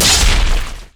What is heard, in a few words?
crit attack; LoL; tryndamere